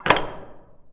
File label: battery take